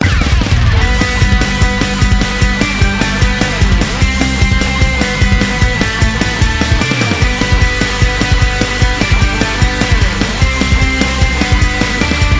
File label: Jingle Bells Punk Rock